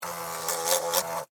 Wasp Fly Wing, Take Off, Land, Buzz, Close, Insect; Animal, Wasp; Bug; Hornet Sound Effects; BlastWave